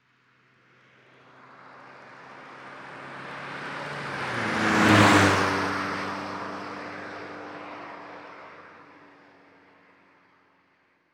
Auto Compact Pass

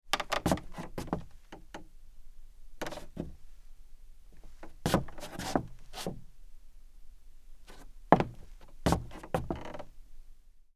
Footsteps Hard Wood Scuff

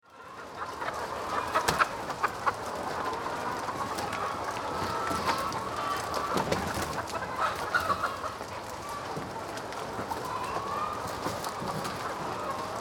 Bird Chicken

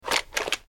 Gun Holster

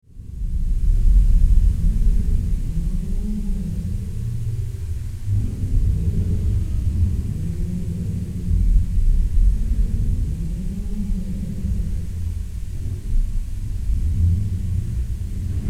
Mining

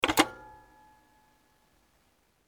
Telephone

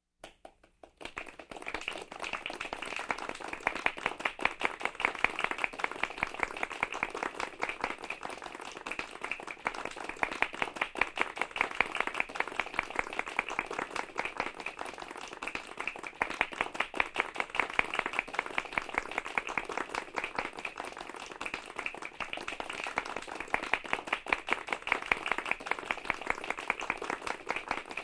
Applause Polite Medium Group

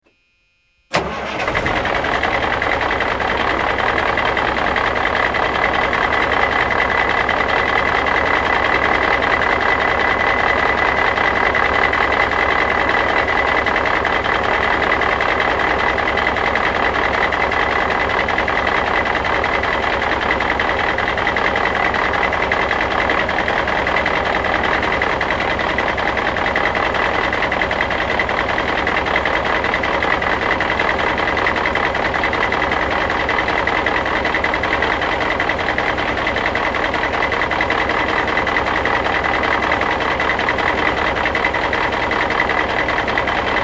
Truck Start-Stop

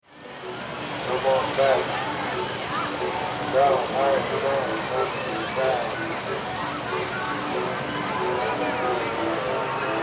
Fair

